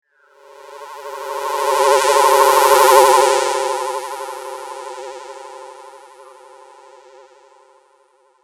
Hover, Robot, Pass, By, Scifi

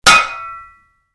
Spade hit, Shovel, Impact; Yard Tools; Stuart Duffield

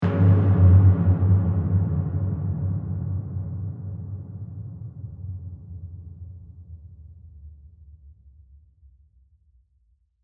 Hit Drum Deep; Hit Sound Effects

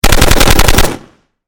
Machine Gun Fire Design; Warfare; Machine Guns

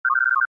Computer Bleep: Indicating Activity; Beeps; Multimedia; Stuart Duffield

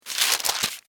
Extermination, Fly, Paper, Down, Insect, Bug; Blastwave; Animals:Insects

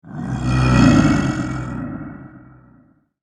Echo The Dragon, Monster Sound Effects; fantasy sound effect; role playing games, magic videogames

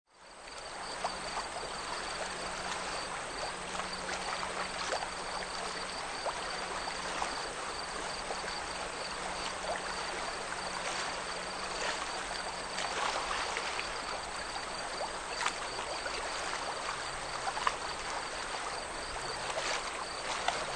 Harbor Ambience Marina Waves Insects Rural; Boat Sound Effects; Lynne Music

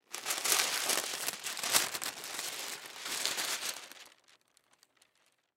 Wax Paper Roll Up; Kitchen; Household; Blastwave

